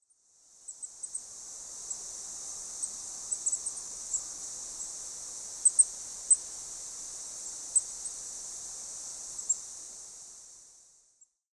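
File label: Goldcrest, maruyama; koe; kikuitadaki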